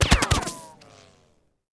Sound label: Machine Gun Dirt; Civ4 warlords